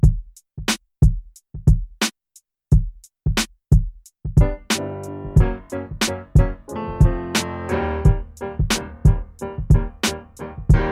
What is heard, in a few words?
Instrumental Four